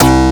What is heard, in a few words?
TECH C4, BASS; Q Up Arts; Dream Experience